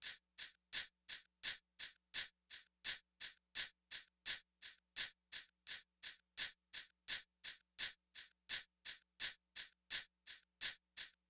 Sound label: Diffuse Drum Loop 85 BPM Shaker, Lofi; Cymatics; ANALOG EVOLUTION Beta